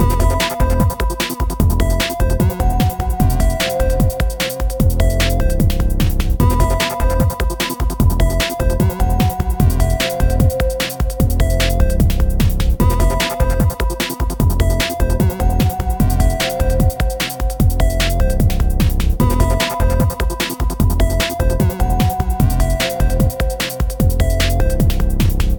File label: loop